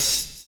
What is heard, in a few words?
JAZZY; Univers Sons